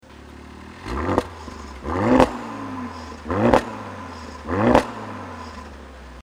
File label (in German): RS4 Vor Opf Stand Klappe AUF; Abgasanlage; Audi RS4 B9